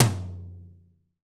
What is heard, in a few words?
TOM, Roland, Real Kit